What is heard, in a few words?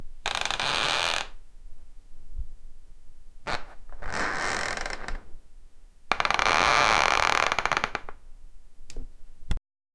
floor creaking, ambience